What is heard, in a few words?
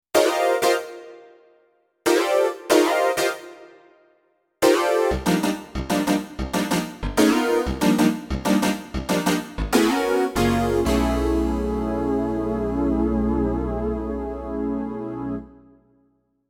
harpsi stabs